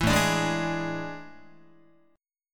A Major 7th Suspended 4th, Guitar

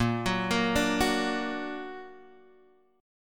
Bb Augmented, Guitar, Drop D; 8,9,8,7,7,x

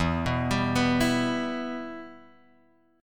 E-Minor, Guitar, Open G

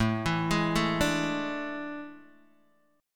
A Major 7th Suspended 4th, Guitar, DADGAD

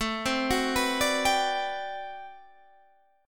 D 13th, guitar chord

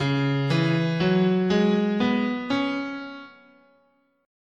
Db Minor Pentatonic, scale

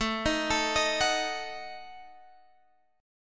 Eb Diminished A

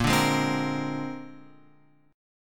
A sharp 9th, chord; A#9 Chord {6 5 6 5 X 6} strummed

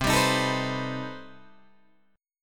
C Minor Major 13th C down Guitar DADGAD

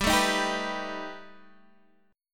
B Augmented 7th G, chord

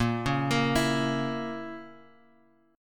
A sharp Diminished Guitar Open G, chord

